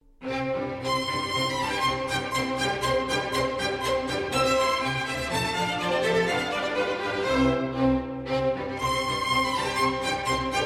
Contretänze für orchester; Tanz X

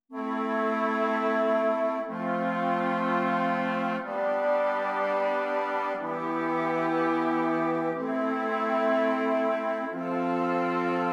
Stimmiger Contrapunkt; Ronge

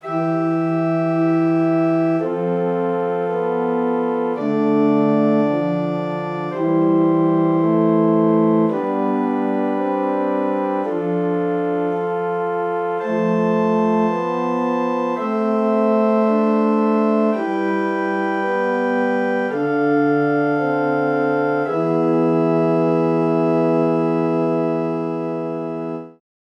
Stimmiger Contrapunkt; Ronge

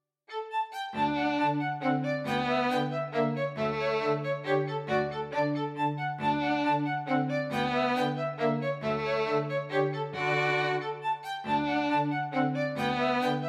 Landlerische Tanze fur zwei violinen und Bas; Sechs Landlerische Tanze; Tanz 6

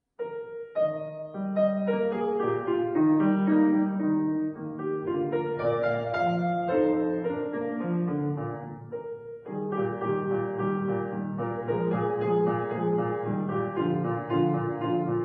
Klaviersatz, pianoforte; von Christian Friederich Daniel Schubarts Kaplied

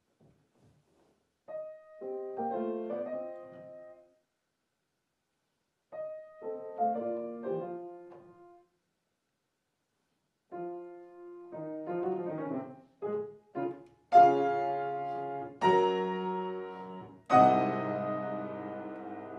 Klavierstück B Dur für Klavier Ziemlich lebhaft; für Marie Szymanowska

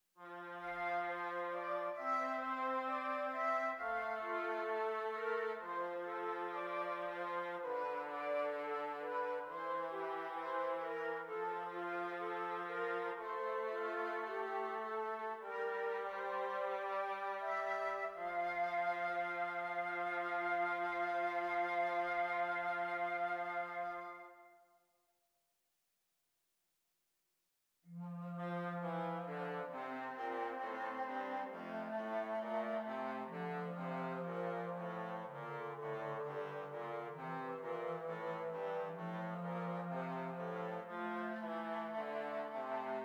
Zwei simmiger Contrapunkt; Ronge

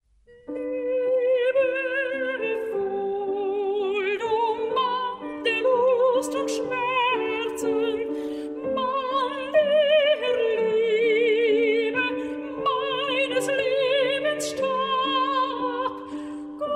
Lieder mit Klavierbegleitung Adagio; Acht Lieder verschiedener Verfasser; Mollys Abschied

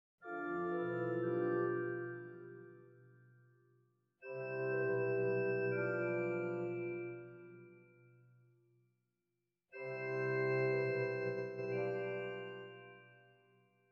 Aufhaltungen des Quintsextenaccordes

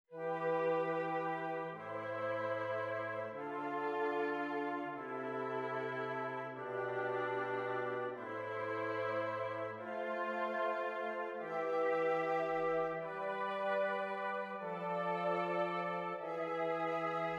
Zwei simmiger Contrapunkt; Ronge

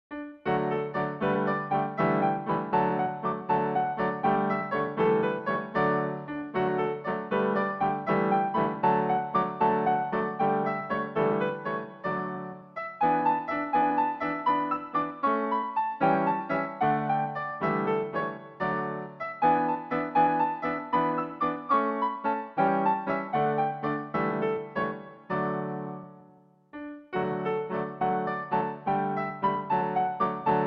ländlerische tänze Klavierübertragung; Sieben ländlerische tänze vermutlich für zwei Violinen und baß; Extra Tänz

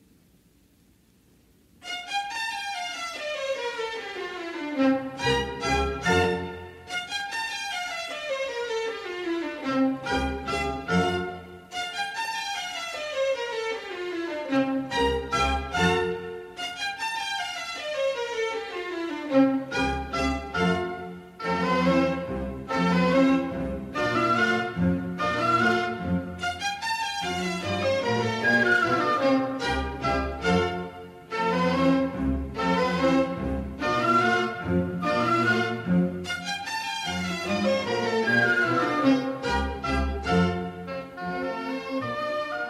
Deutsche Tänze für orchester

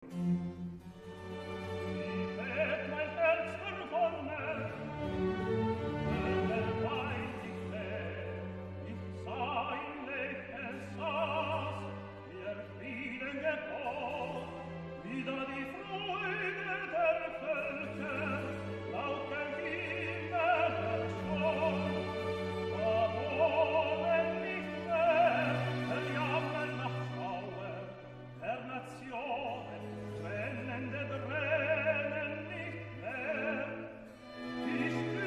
Kantate fur Solostimmen Chor und Orchester Rezitativ; Kantate auf die Erhebung Leopolds II; Tenor

